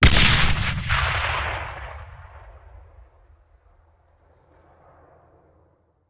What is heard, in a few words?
Grenade Hand; Sound Ideas; Guns